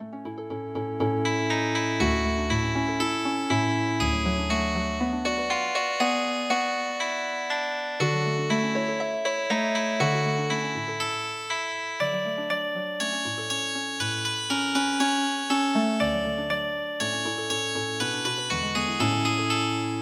Healing Harp, traditional

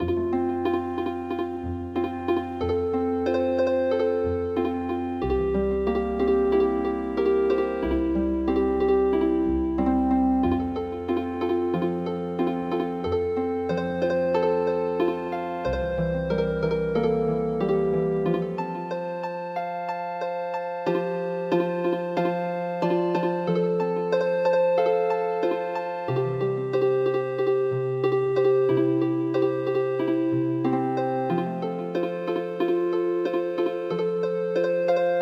Healing Harp, traditional; Ten Little Indians

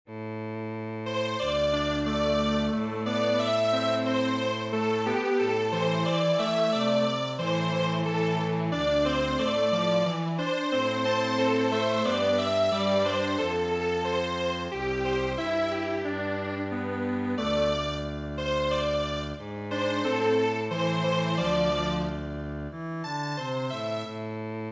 Strings Quartet

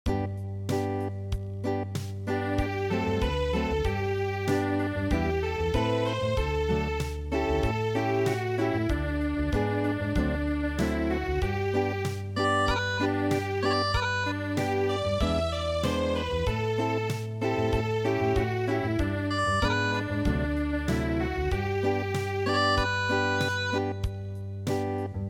Acoustic, traditional

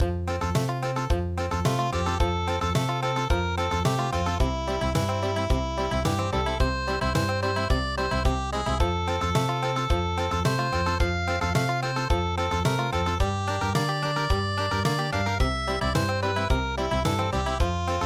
Country, midi

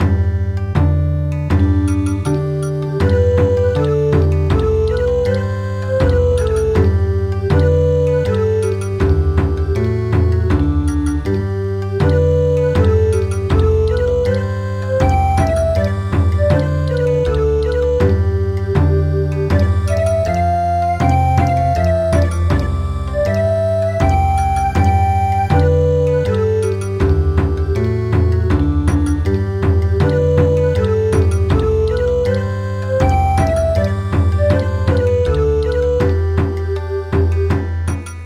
Bon Odori

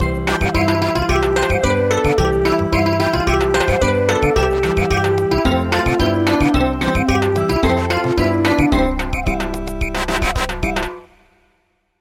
Samba, midi traditional

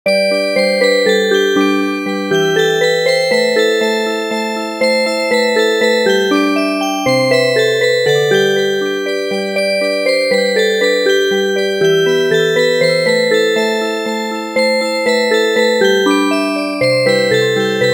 Music Box

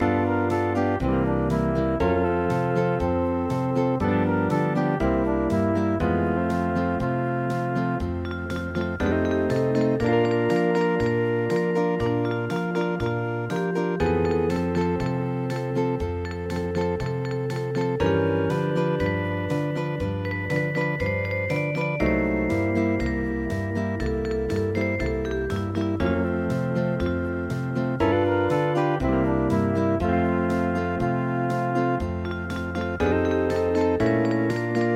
Hawaian

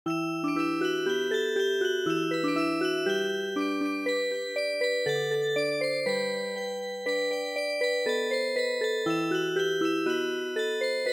Music Box; VOLGA River

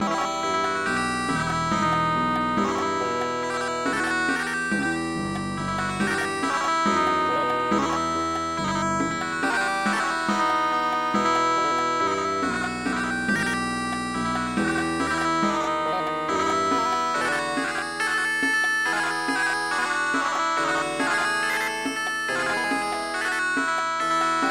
Swing Festival Song, Indian Traditional

